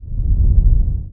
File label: worm rumble; Emperor - Battle for Dune